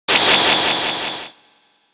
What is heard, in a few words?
turn change